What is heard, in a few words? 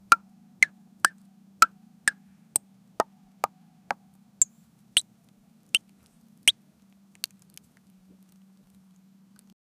cheerful plucking sounds; fruits